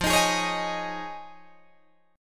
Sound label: F Minor 7th Sharp 5th, Guitar, DADGAD